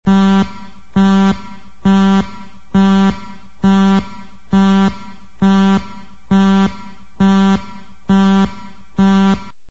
alarm

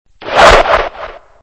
throw

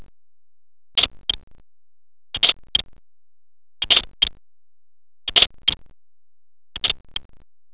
click

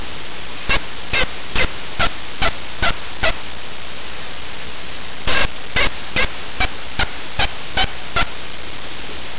tree call, turkey sounds

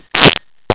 fart

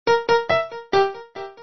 piano

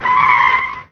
tires

